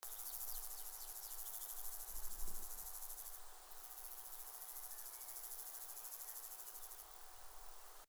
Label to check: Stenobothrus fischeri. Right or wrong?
Right